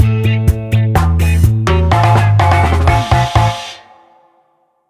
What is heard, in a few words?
Cool Summer Reggae Music Stinger